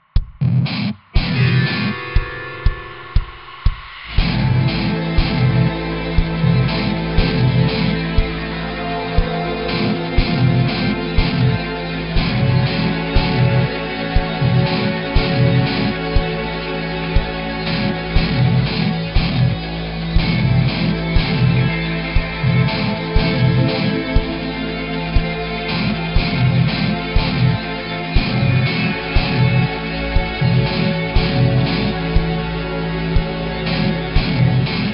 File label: End Game Song; 2013 Infected Wars; PS Vita